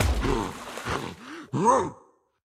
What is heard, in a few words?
Laser Cool Down; Going Under; Caffeind Founder